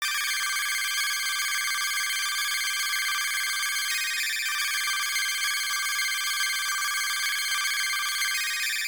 synth1; AKIRADEATH

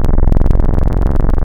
Analog Ultra Saw; Synthetic's Serum Collection; Echo Sound Works Core Tables

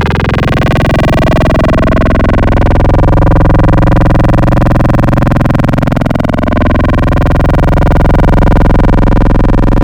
Fm, Wavetables; Synthetic's Serum Collection; Cymatics - Nuclear for Xfer Serum Wavetables; H-Series II

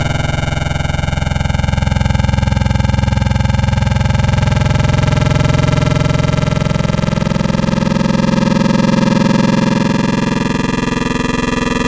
Digital Bit Bass; Wavetables; Synthetic's Serum Collection; ESW Core Wavetables; Echo Sound Works Core Tables

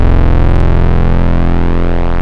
PWM; Synthetic's Serum Collection; BVKER - Synthwave

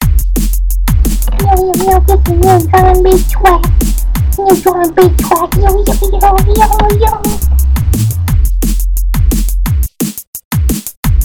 new drum n bass twack

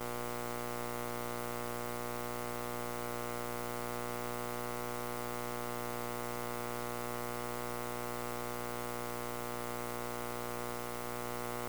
Bar Light (Base); Electronics Of My Great Grandma's House

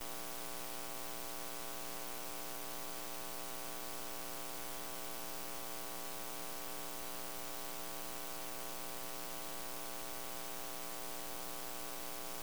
Light Up Light Switch In The Kitchen; Electronics Of My Grandma's House

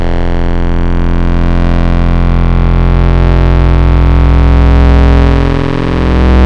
Digital Warm Tubes; Synthetic's Serum Collection; Echo Sound Works Core Tables

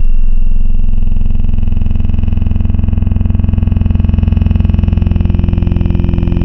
Organ; Instruments; Synthetic's Serum Collection; Echo Sound Works Core Tables

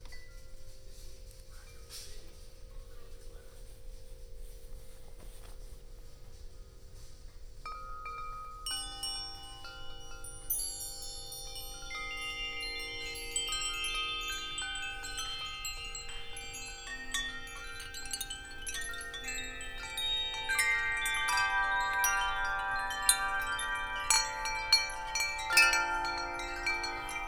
Playing With Wind Chimes; Running Errands On A Rainy Day

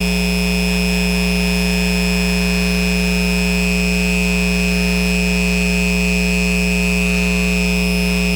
Leafblower; Electronics Of My Grandma's House

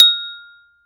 pipe piano mallet; ESW Sphere

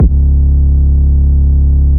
808 C long; Synthetic's Serum Collection; Skifonix Sounds - Trap Pop & Chill

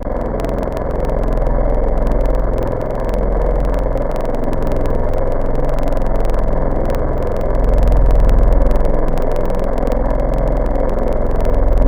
Vocal Fuzzy Vox; Wavetables; Synthetic's Serum Collection; ESW Core Wavetables; Echo Sound Works Core Tables

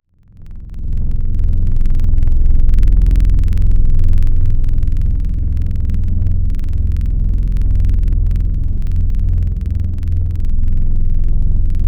Dirt String; Synthetic's Serum Collection; XLNT-Skrilla Tables

